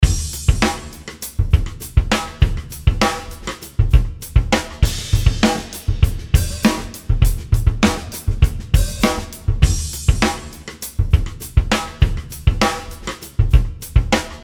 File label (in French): batterie